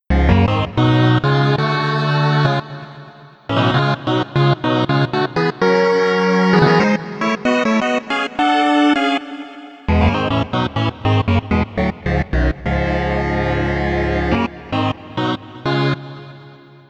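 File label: lead solo detuned trio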